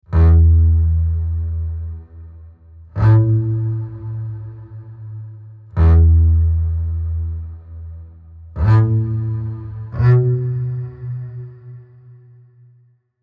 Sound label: The Man Who Played One Chord